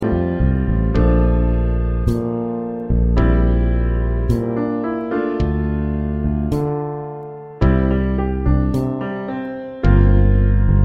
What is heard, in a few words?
Accompaniment